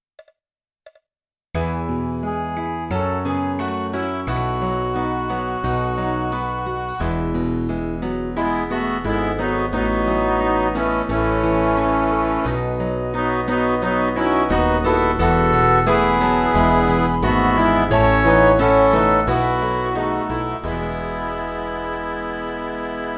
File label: midi